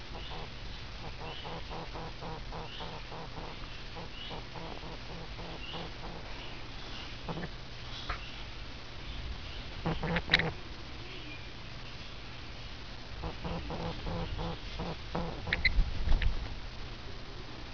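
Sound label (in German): brummen beim schnaebeln, wellensittich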